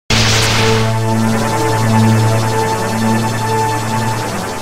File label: Sound effect master spark; 东方Project